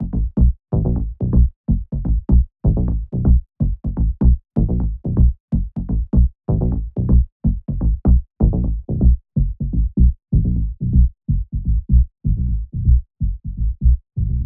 125BPM DRIVE BASS B

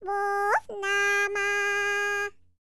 BULGARIAN, World Voices, BALKAN

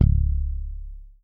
SA4MUTC#1, Bass; Sampleheads; Will Lee Bass Library

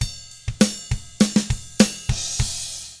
ROCK, Drums

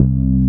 E BASS, Roland

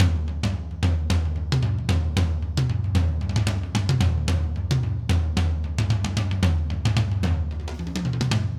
Acoustic Live Drum; Platinum Audiolab

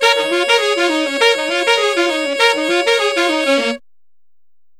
Sax Section in Bb, Jazz; Sonic Foundry (Sony Creative Software); Jazz Solos and Sections

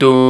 VOX DOON C4, Scats Choir; 90s Sample CDs; Club-50 - Foundations Roland; Short Scats

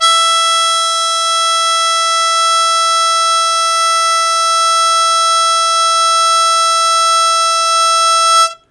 Loud Attack Sustain E2, Harmonica; Zero G - Harmonica

